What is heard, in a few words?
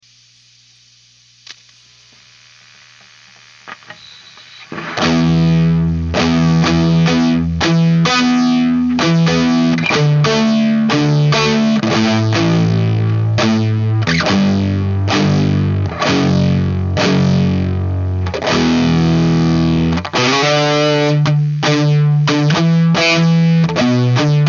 fizz